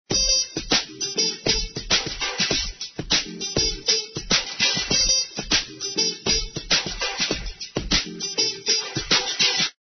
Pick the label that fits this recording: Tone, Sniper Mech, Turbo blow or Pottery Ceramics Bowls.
Tone